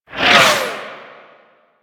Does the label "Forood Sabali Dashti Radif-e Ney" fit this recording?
No